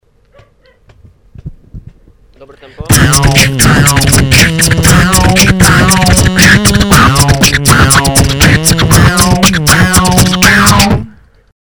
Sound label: BeatBox